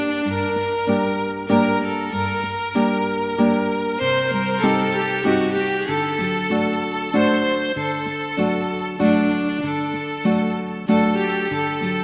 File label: Guitar Flute